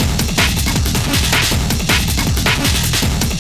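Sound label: drum loop; X-Static Goldmine 3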